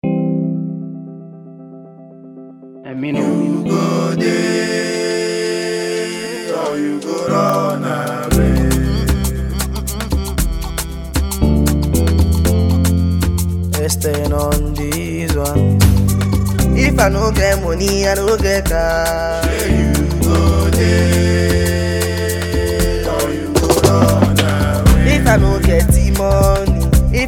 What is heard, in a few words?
Instrumental